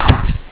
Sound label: victory; openrsc